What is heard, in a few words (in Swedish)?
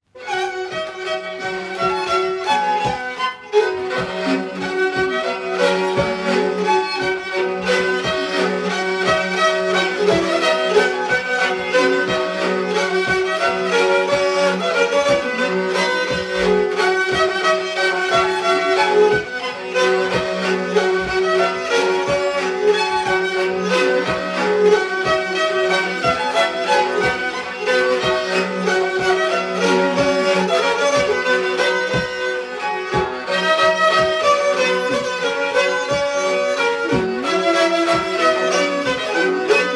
Nyckelharpan